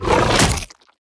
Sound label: heroes renew Flesh cast; grimson